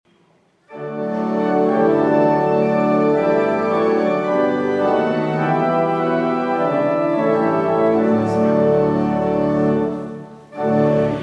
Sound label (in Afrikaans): Gemeentesang